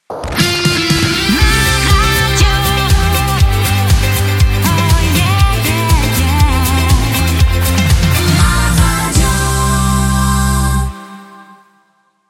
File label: ROCK